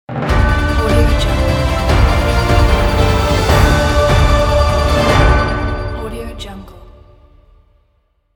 news logo intro

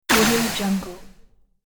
spell hit blasting power

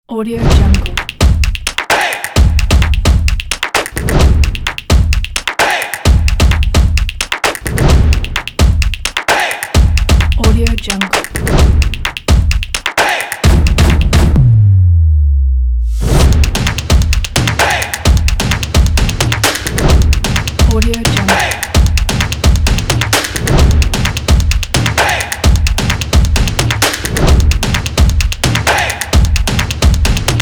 stomping it

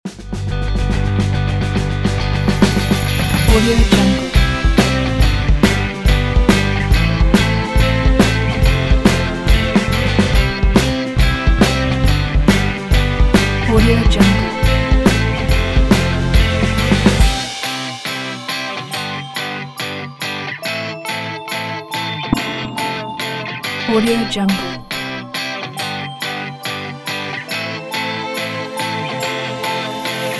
shuffle christmas rock

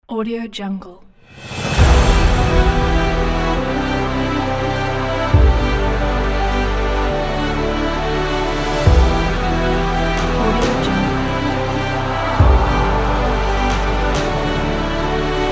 be epic; smartsounds

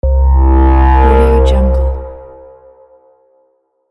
motion bass sweep opener startup

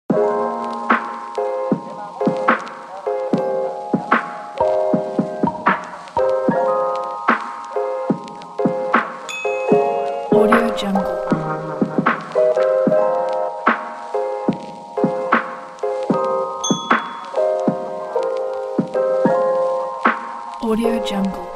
instrumental hip hop

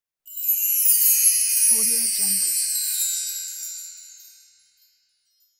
magic wand

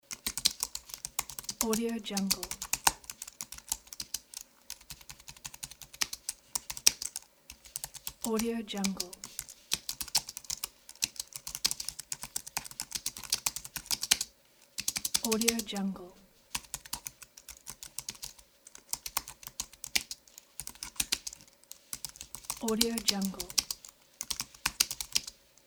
typing keyboard

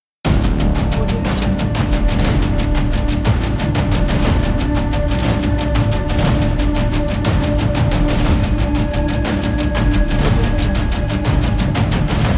epic tension logo pack; icyvibes

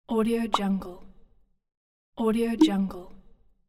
pop up sounds; soundhq

